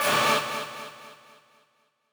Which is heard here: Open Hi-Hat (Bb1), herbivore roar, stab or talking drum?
stab